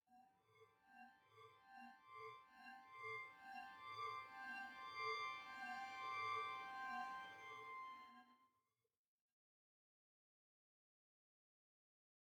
Synths II & Pads

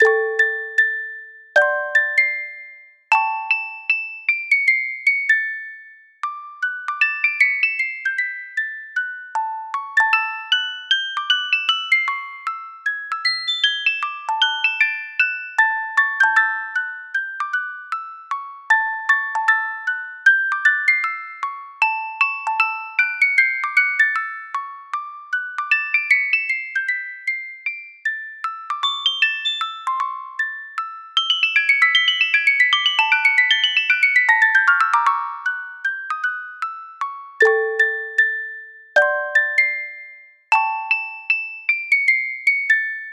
Rockabilly (Music Box); animal crossing new leaf welcome aamiibo soundtrack

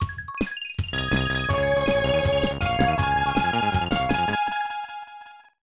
Unused Jingle; Kiteretsu Daihyakka Choujikuu Sugoroku; SNES

